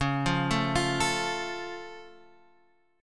C sharp Augmented, C sharp, down, Guitar, Standard; arpeggiated; JGuitar